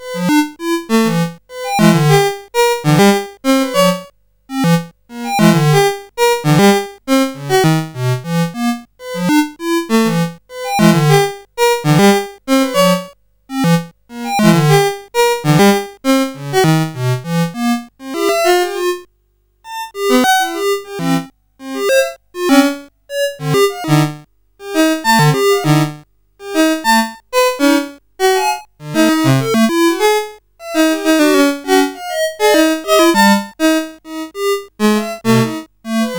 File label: Atonal Music, 8580